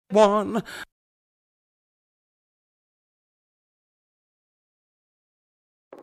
filmmusik

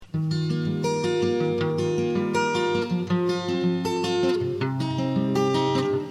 gitarrensamp

